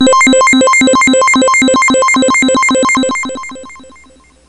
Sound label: FX RAIN